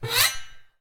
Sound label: Piano Metal Mallet Scrape Full String Mid Damp